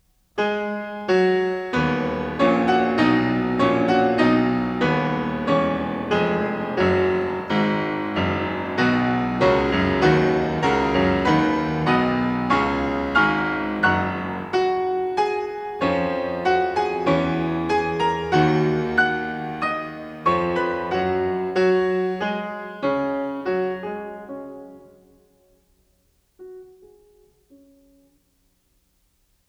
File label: Pictures at an Exhibition for piano Promenade; Mussorgsky